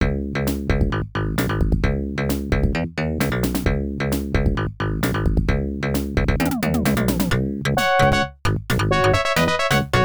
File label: Battle; Emerald Dragon; pc-88